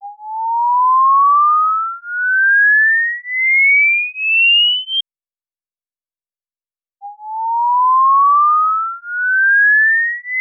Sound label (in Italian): Prova ritardo elettrico fra canali 12 (Left) e canali 34 (Right); CESI; LaSpezia